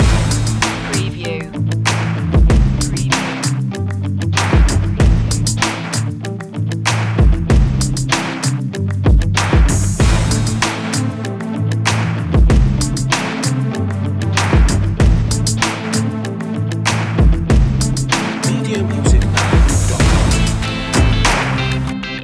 lavish lifestyle loop

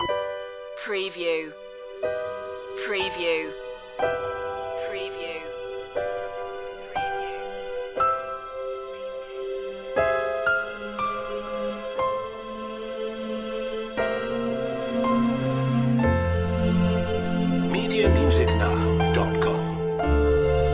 orchestral romance loop

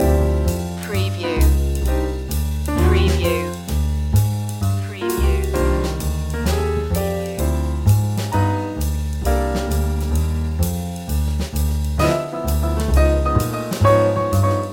fresh jazz loop

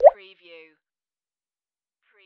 wet; Button / Multimedia Sounds

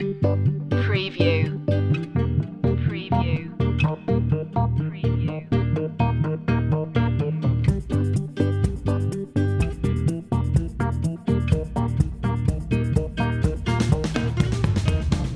got game loop

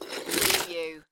Snow and Ice, Ice scrape small

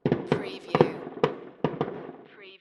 Firework bang; beanstalk audio

